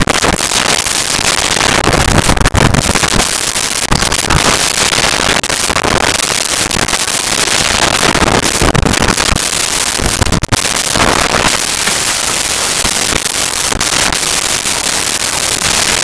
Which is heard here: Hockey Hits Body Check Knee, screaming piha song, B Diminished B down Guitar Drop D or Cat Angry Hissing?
Cat Angry Hissing